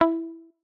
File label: pluck